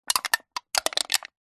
drink can empty crushed handling movements twists crinkle